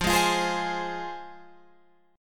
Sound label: F Minor F down Guitar Open F; strummed; JGuitar